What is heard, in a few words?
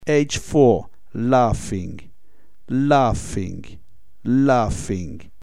laughing